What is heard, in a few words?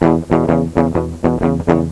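GUITAR RIFF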